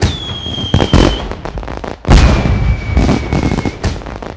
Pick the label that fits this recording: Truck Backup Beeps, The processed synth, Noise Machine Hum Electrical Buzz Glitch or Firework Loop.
Firework Loop